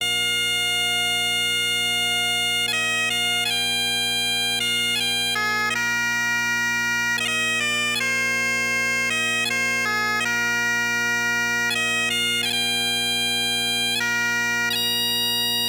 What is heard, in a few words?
Drones